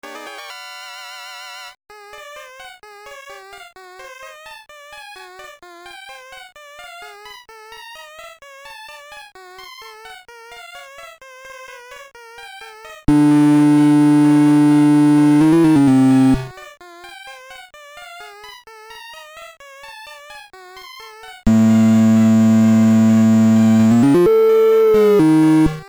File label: Sand Worm Cave; just breed nes